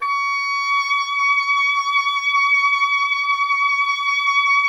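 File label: WIND OBOE; Miroslav Vitous; Solo Instruments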